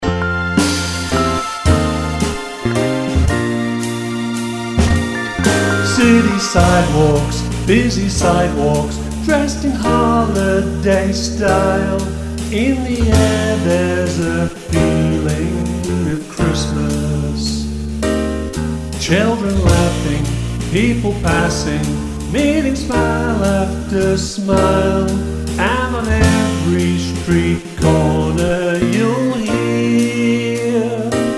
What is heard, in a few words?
Vocal